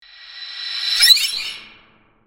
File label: Stng Med